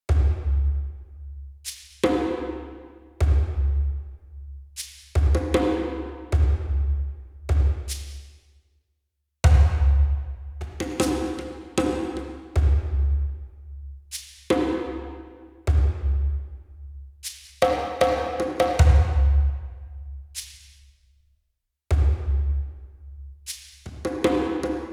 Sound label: Exploration; The Witcher 3 Wild Hunt; Skellige